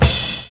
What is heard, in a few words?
RIDE FILL; Univers Sons